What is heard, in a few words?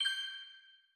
mirror Sym Lands; netent